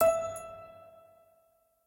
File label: wood chip minor fast